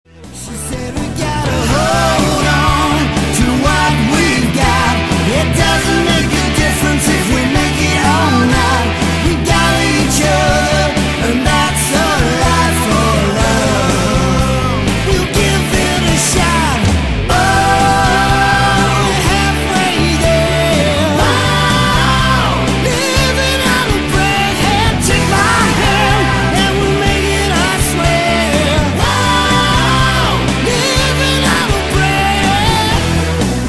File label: Rock And Pop Trio; WestMidlands